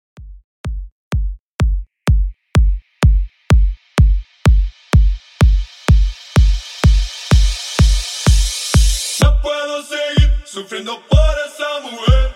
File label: In Outro Latin House 126Bpm